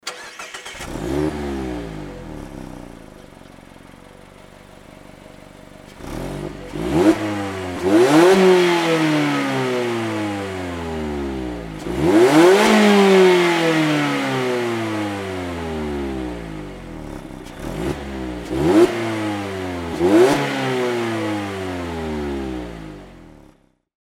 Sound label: nd roadster normal; nd5rc